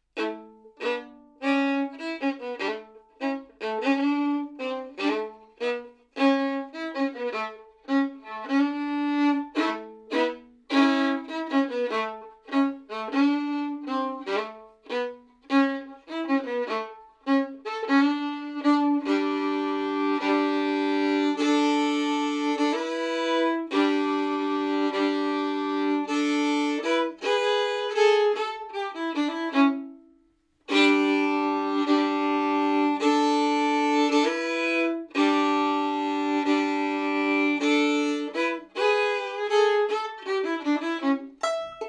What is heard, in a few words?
riff; Akkar